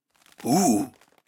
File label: woody low; alohaxmas